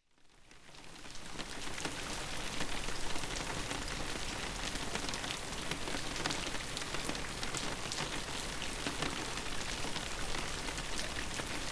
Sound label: Sounds of Rain On a Window for Deep Sleep